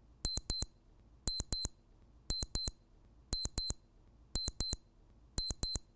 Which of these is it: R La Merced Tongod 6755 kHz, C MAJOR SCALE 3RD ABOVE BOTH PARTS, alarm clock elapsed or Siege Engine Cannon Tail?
alarm clock elapsed